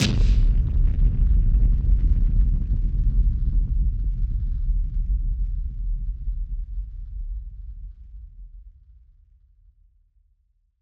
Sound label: Hit Splosion; musicradar; bomb factory